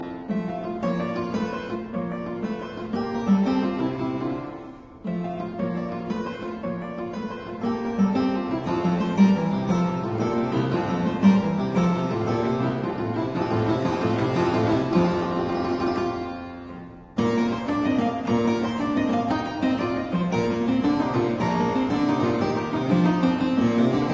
Keyboard Sonata in C major; Soler; Rubio 51

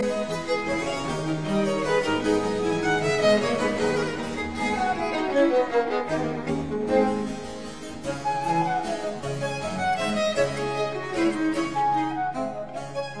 Quartet in D minor Allegro; Quatrieme livre de 6 quatuors; TWV 43d2

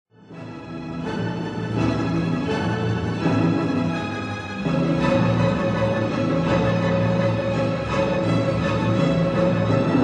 Symphony in A major Allegro con brio

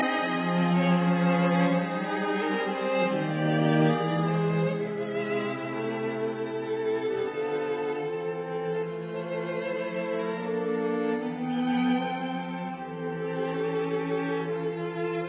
String Quartet in E minor Andante; Faure; Op 121

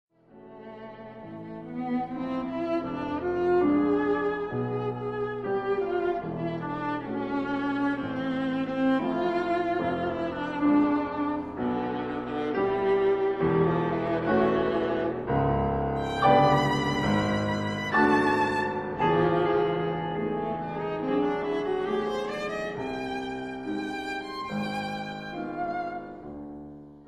Sonata for viola & piano, Dramatique

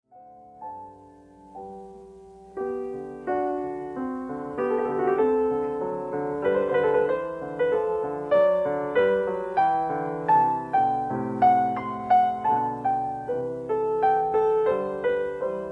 Bagatelle for piano in G major

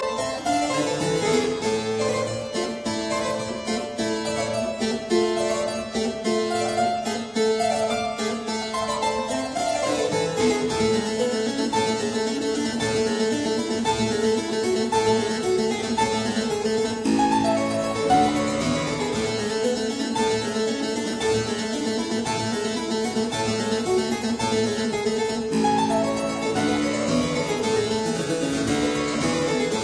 Keyboard Sonata in D major

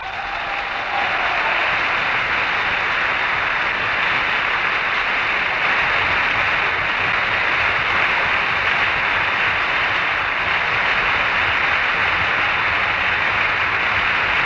Applause; Symphony No 9 in D minor Op 125 Choral; Arturo Toscanini

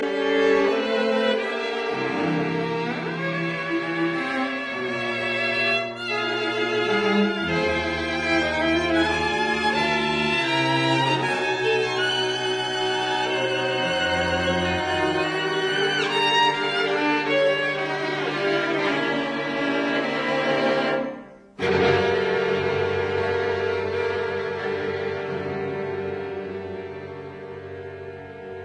String Quartet, Grave e scherzo; Martinon; Op 54

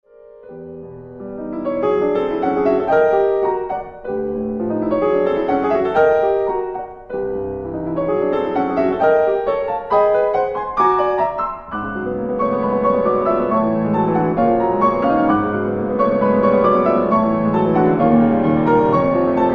Preludes for piano Allegretto

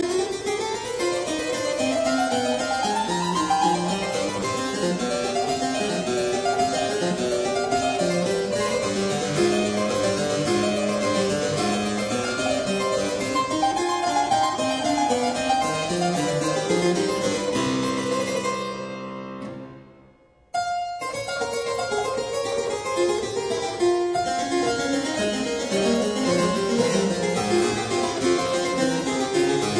Keyboard Sonata in F minor; K 386

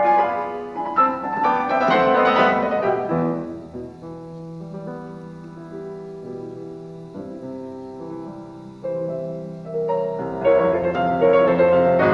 Variations in C major on a Waltz; 33 Variations; Op 120; Variation 5

